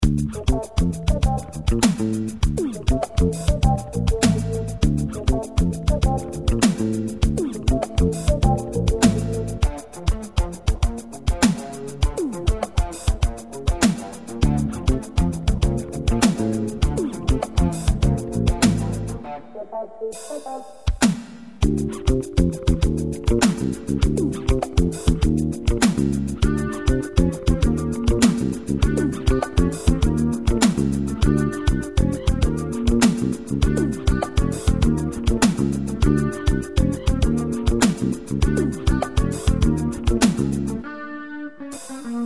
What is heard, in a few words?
samba